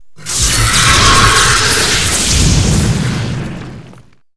Leviathan Cry; Seer; GamigoNA

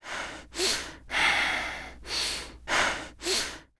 Prisoner Idle

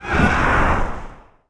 Fire Lich Walk & Run; Seer; GamigoNA

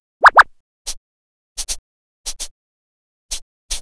Bunny Idle; Seer; GamigoNA

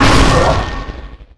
Drain Mind; Qickskil

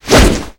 Smart Yeti Attack

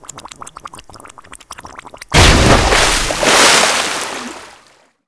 crab foam skill; Seer; GamigoNA

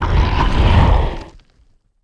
Stony Idle; Seer; GamigoNA

